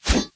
sword swing

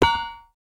metal pipe drop

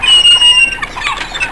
seagull

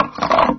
lever

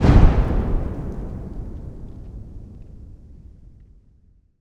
distant explosion

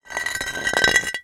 concrete scrape

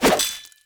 sword swing hit

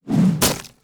axe throw impact

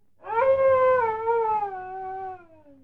wolf howl

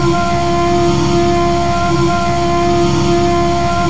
engine low rpm

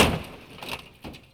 car impact crash

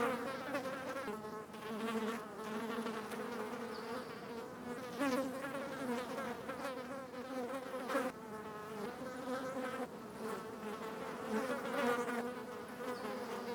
fly swarm

